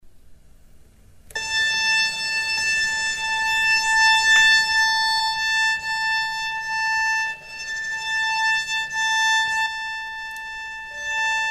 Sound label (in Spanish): base melodía